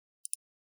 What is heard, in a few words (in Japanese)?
クリック音, button